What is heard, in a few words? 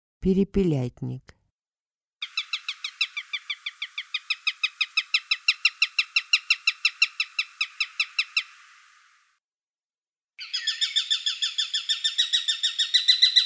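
perepelyatnik golos, sparrowhawk voice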